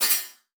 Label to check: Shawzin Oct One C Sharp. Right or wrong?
Wrong